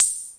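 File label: Yamaha RS7000 hihat cymbal, Drum machines; Yamaha RS7000 Cymbals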